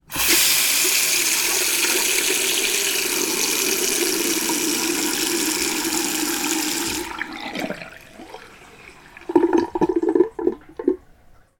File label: household sink tap on water run off gargle; sound effects one